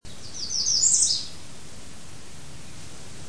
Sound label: American Redstart